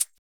Percs

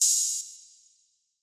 Openhats

